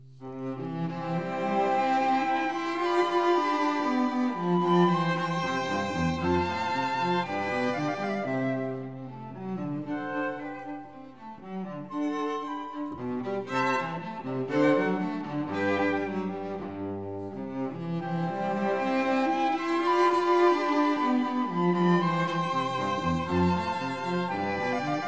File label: flute, violin, viola & cello; Quartet; No． 3 in C major, K． Anh． 171 (K． 285b); Mozart; The Flute Quartets